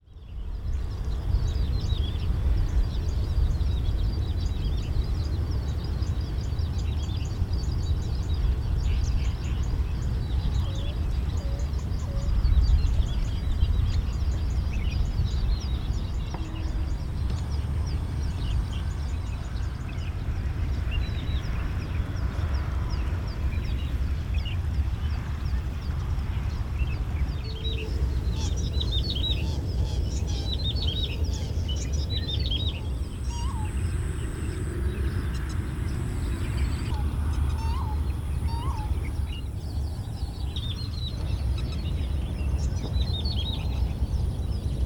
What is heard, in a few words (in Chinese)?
台南官田